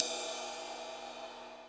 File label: Ride, dry kit